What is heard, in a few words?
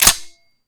prefire, uzi; weapons; arccw ud